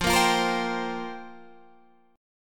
F Suspended 2nd Suspended 4th F down Guitar Standard; strummed